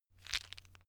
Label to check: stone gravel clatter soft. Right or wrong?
Right